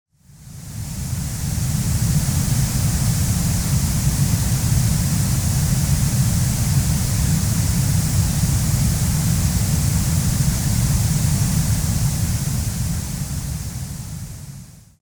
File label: Study Noise